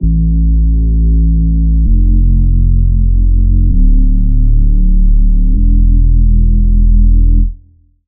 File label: Reese; Routed Sound; Spire Expansion - Noble Gangster Vol.1